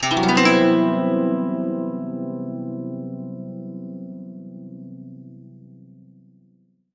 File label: zheng, ZHENG HARP; World Instruments 2; STRINGED INSTRUMENTS